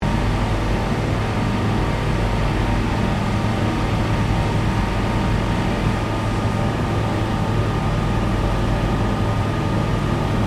Ambience Industrial Plant Room Surround Front LR, Surround Ambience of an industrial plant room; Factory; Factories